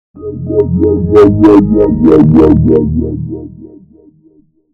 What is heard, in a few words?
in Wodding Game